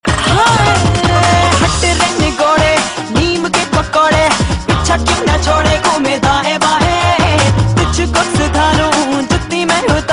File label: Female